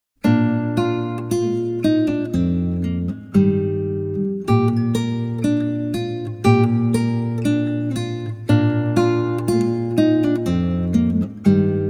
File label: scottish air